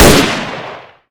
fn2000 shoot

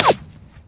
whine, weapons

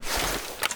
rpg7 draw, weapons

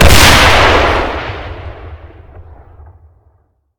rpg7 shot

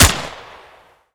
9a91 shoot

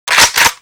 spas12 close, weapons

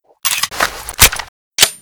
kimber reload, weapons; colt

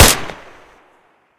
rpk shoot

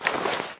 m98b draw

